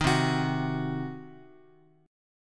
C Diminished C, Guitar 4A,4E,5A,5D,5G sharp,6C sharp; strummed; JGuitar